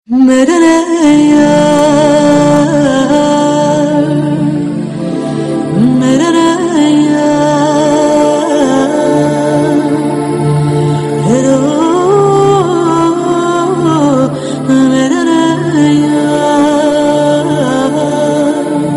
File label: Soulful Tone